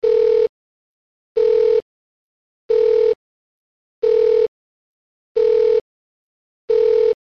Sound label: speed warning